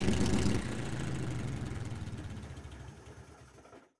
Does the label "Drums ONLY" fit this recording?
No